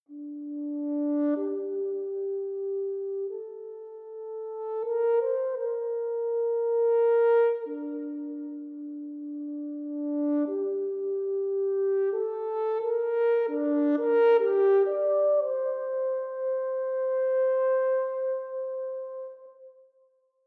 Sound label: horn line